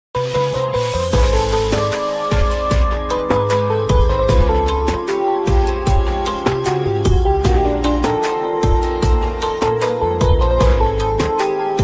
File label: piano flute ringtone